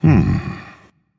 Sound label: thinking, dragon; fafnir